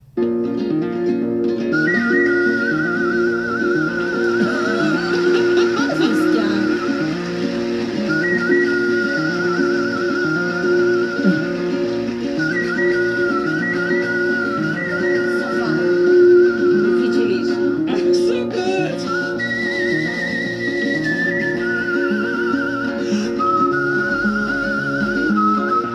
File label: Country and western whistle